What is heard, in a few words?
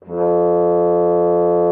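French horn F2; Xsample VOL-3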